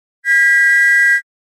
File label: whistletone